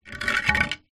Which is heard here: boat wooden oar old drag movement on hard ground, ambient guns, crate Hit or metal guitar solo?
boat wooden oar old drag movement on hard ground